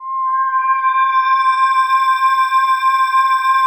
PAD, DIGI-PADS

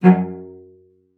F#2, CELLO SPICC; Xsample VOL-5

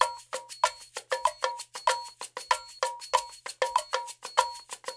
NYC Percussionworks; Sampleheads; GJ LOOPS 96

